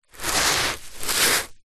footstep single scuff scrape barefoot on foam exercise mat; zapsplat; foley